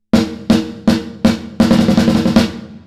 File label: Snare intimate; smart reverb